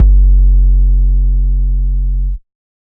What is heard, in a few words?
Bass, Dubstep 808 Basses; GhostHack